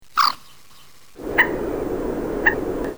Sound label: Gallinula chloropus